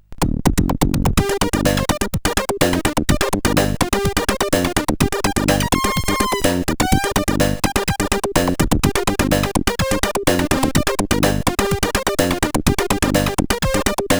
sid, CSG8580

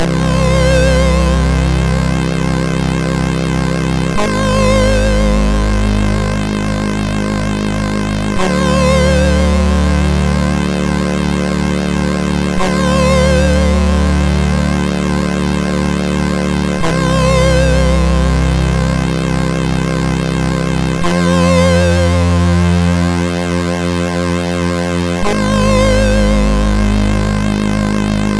E-Load loader, MOS6581R4; hvsc

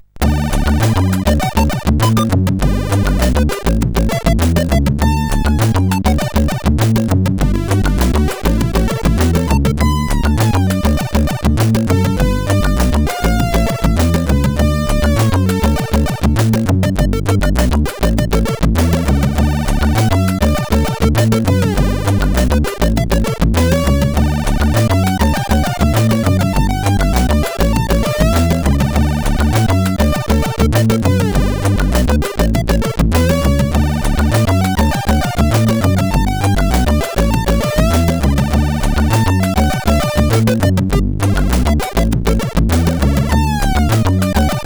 Hot cat, CSG8580R5

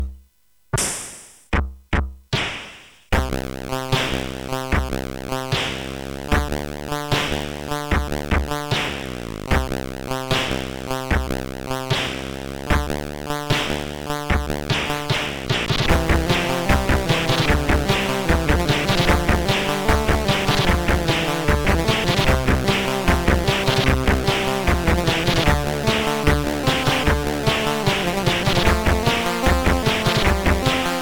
C-64 Tune, CSG8580; CSG8580R5; hvsc

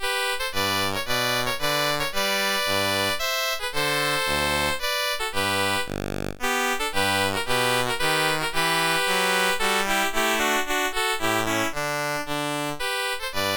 Minuet in G, CSG8580R5

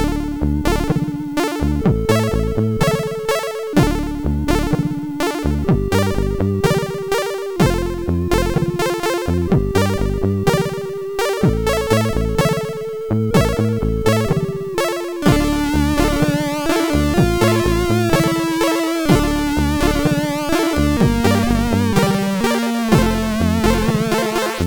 sid, MOS 6581R4; hvsc

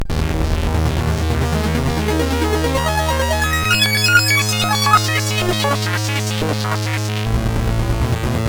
Moog Swing, sid; CSG8580R5

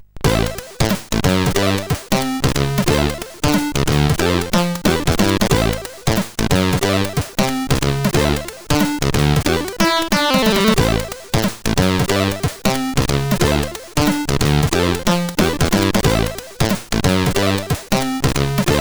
Funk, CSG8580R5

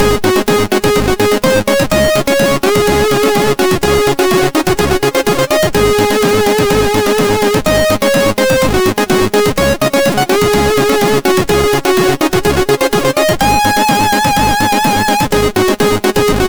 Eon sid MOS6581R2

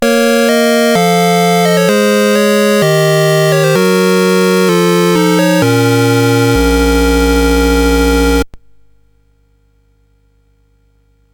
Dragonworld sid CSG8580R5

